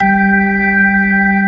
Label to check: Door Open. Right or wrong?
Wrong